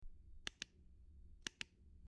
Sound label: touchscreen